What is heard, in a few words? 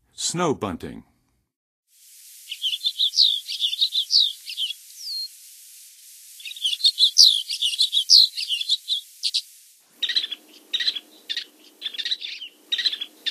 Snow Bunting; North America; Western USA; Western USA Songbirds